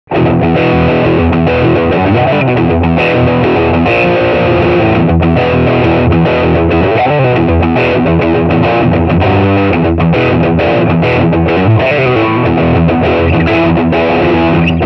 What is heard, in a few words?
Channel HVY Metal